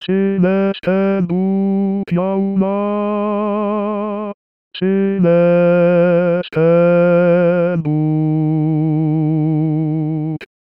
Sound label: Sine dut Basse